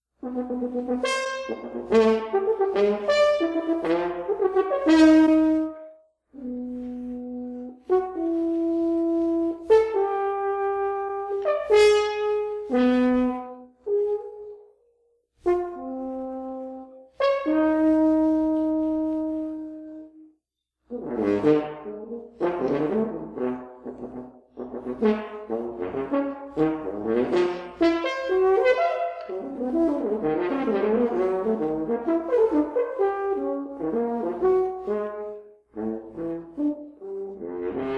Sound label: Three Pieces for French Horn